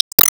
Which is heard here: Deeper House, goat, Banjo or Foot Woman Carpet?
Foot Woman Carpet